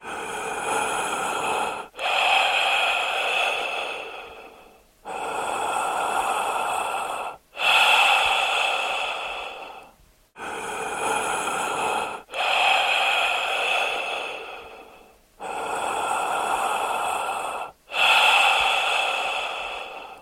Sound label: slow breathing